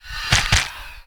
imp shoot; Risk of Rain

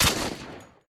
bullet; Risk of Rain

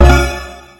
achievement; Risk of Rain

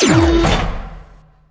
Chest; Risk of Rain

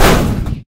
Janitor Shoot; Risk of Rain

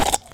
mush hit; Risk of Rain

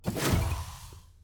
heal; HLD

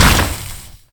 feral shoot; Risk of Rain

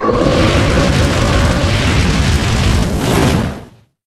w Worm Roar; Risk of Rain

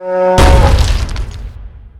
Bison Death; Risk of Rain

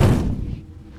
Boar Explosion; Risk of Rain

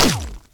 guard hit; Risk of Rain

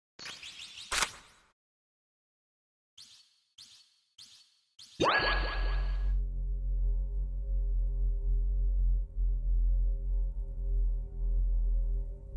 monolith activation; HLD

